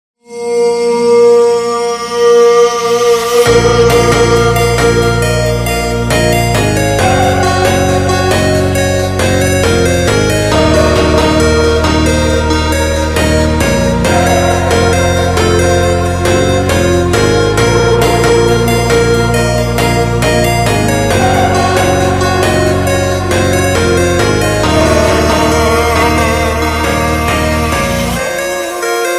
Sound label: Slowed Reverb